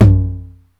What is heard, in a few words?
low tom, tr909